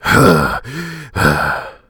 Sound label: GASPS; DN3D; Duke Nukem 3D